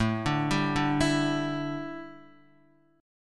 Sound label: A 7th Suspended 4th Guitar, chord; x,0,2,0,2,0; A7sus4 arpeggiated